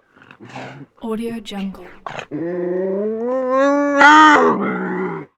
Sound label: wildcat growls; hollywoodedge